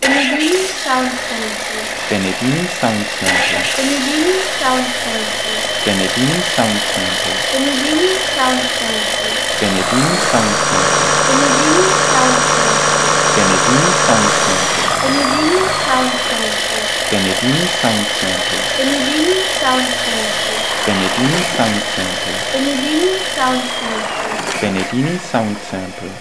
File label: Cummins Twin